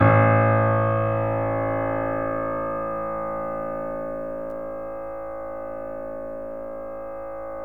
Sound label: A, The Piano; InVision Interactive - The Piano